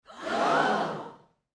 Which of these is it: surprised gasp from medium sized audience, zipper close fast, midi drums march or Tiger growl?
surprised gasp from medium sized audience